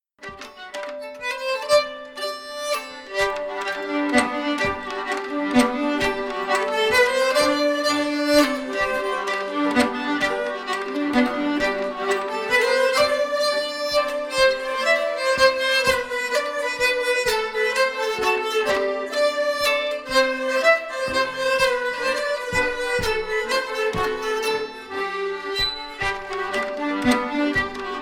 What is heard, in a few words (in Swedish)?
Fryksdalspolska G 126bpm